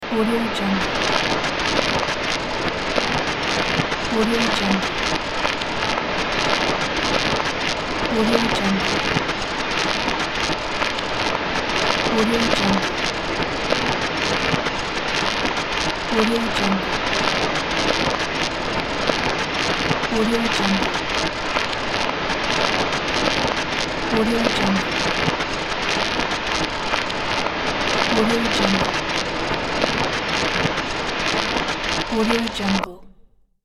soundscape scifi machinery

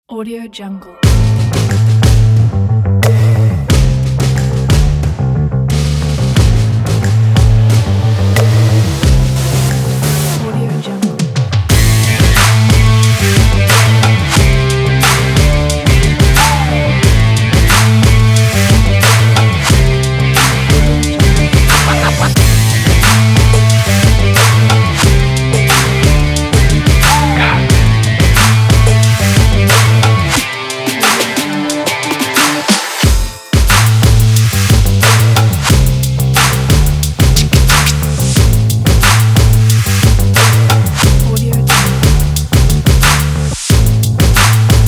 action sport stomp claps

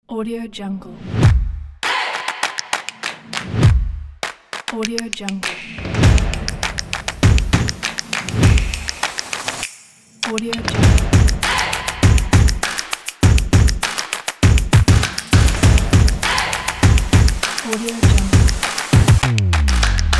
percussive; joysound

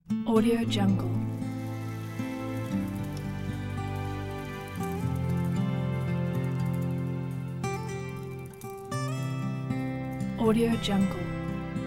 heartfelt acoustic

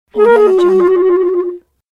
cartoon lost clarinet trill

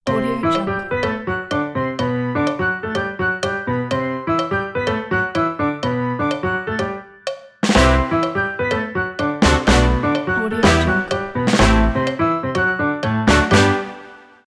jazz short intro; yfl-music

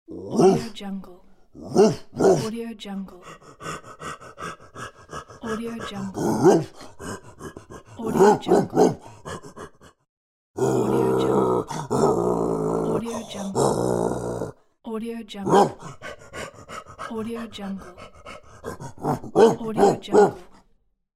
cartoon big dog barking and panting; applehillstudios

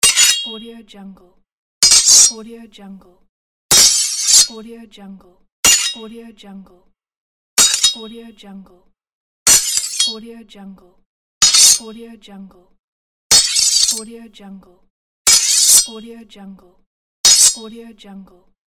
sword clash

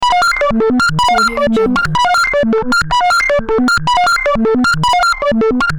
scifi technology loop

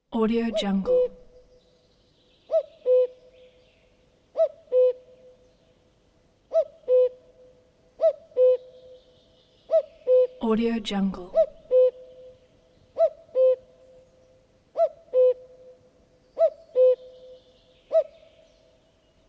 cuckoo

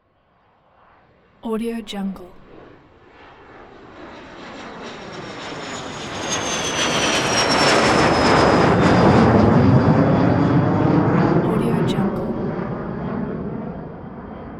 jet pass by; blast drive 30; prosoundfx

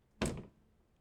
door push open

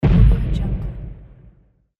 distant explosion

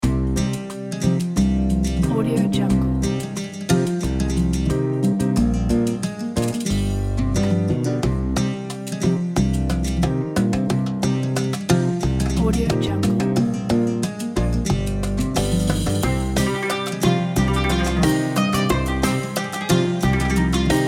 happy arab festival

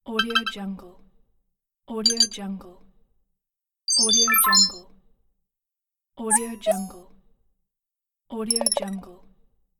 pleasant game sounds

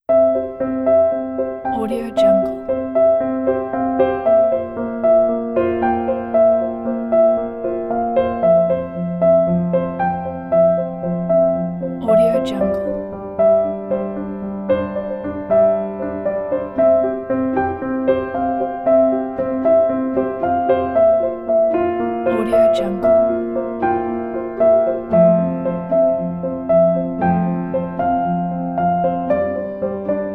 inspiring soft piano

